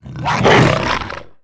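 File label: kinrath hound attack; Star Wars Knights Of The Old Republic I; XBOX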